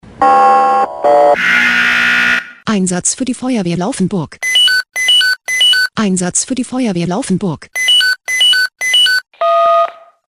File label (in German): SMS Alarmierungstone; FW Laufenburg